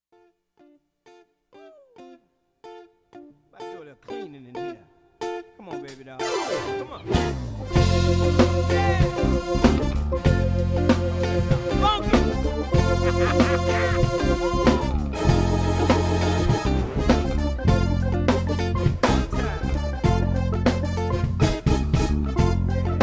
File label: variety band